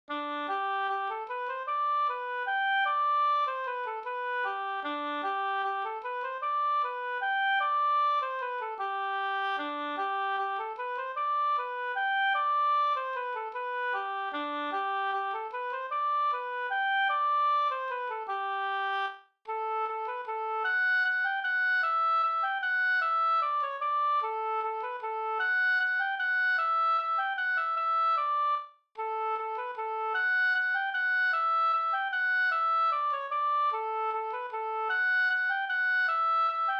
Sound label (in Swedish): folkdans, no; Attetur l fr Asker